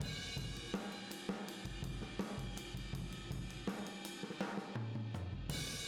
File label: Boogaloo Fill Room M, 82 BPM, Drums; Sonic Foundry (Sony Creative Software) - Drums from the Big Room The Mixes